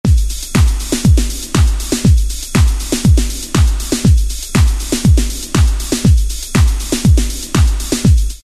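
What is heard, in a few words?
909 Disco 120; Dance; Roland SR-JV80-06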